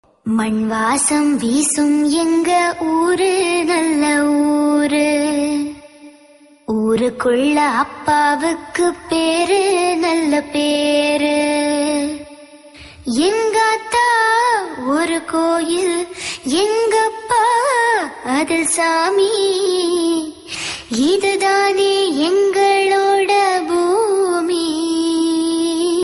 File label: Sentiment